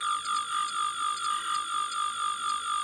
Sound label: tricorder; romulan